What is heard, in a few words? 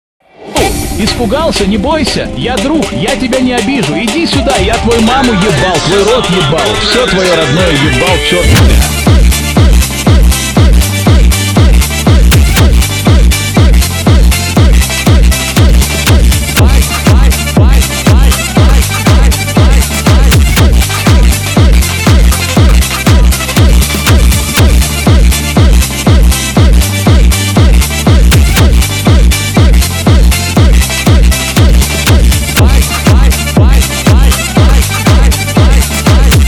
lezginka funk